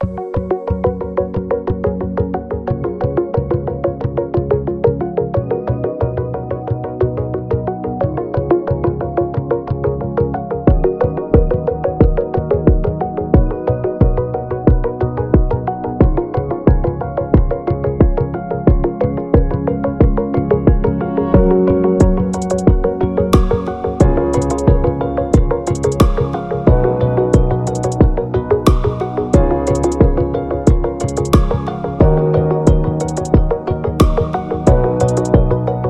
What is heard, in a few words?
Positive Music